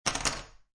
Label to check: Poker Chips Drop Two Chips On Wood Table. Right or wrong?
Right